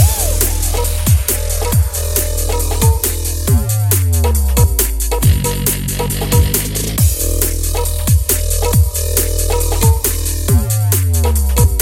Breakbeat

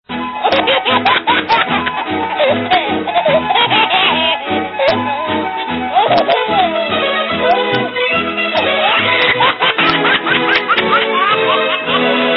Baby the best laugh

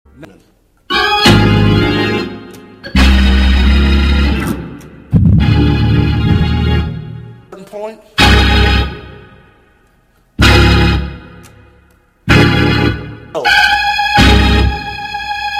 organ shout

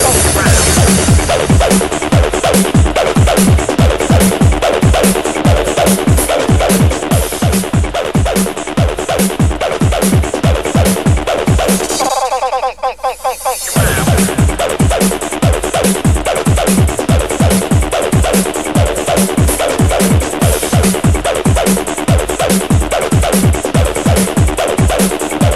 BreakBeat

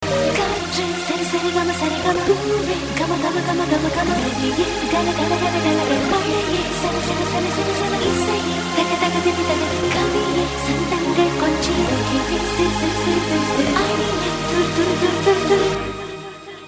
bgm

